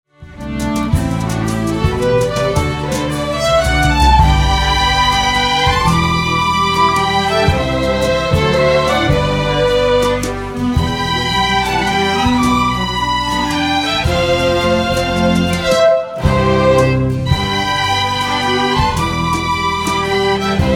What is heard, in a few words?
Waltz